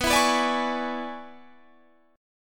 E 13th B

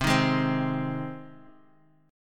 C Minor Sharp 5th C down Guitar; Cm#5; strummed; JGuitar

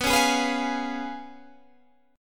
Db 7th Cb, guitar; Db7/Cb strummed

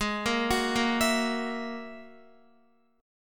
Ab 7th Suspended 2nd Sharp 5th down Guitar; Ab7sus2#5; arpeggiated; 4F,4A,5C,5G,5C,6E; chordshape; JGuitar

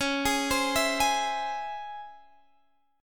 C sharp Minor Major 7th C sharp, chord; C#mM7; arpeggiated; x,16,18,17,17,16

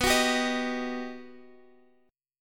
G Augmented Major 7th

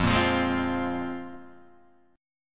A sharp 7th Suspended 2nd F, guitar

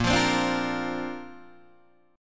Bb Major Add 9th, Bb, down, Guitar, Open D

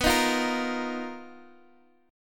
B Major 7th Flat 5th B down Guitar Open G

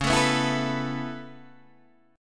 C sharp Minor 7th Sharp 5th C sharp Guitar DADGAD

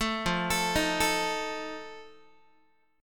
F 7th F down Guitar; arpeggiated; 12,13,12,13,13,x

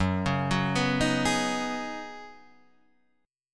C sharp 7th Suspended 2nd Suspended 4th F sharp

